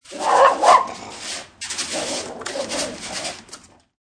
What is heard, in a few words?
Vicious Dog; Vicious mean dog barking and growling behind a metal gate